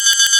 UI fx enter; SpaceshipDemo

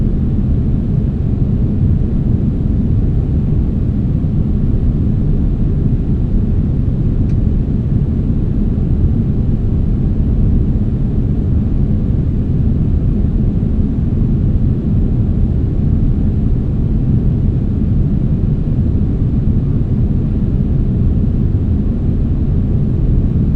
room amb ventilation loop; Ambient; SpaceshipDemo

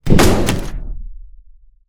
player hit head

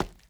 Concrete, Footsteps; FirstPersion AIO Pack

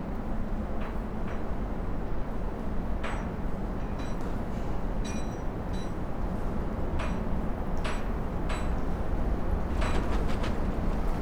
Building Blocks shantytown; Buildings; Megacity

